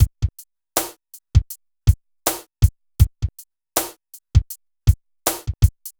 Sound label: Synth Loop, BPM 80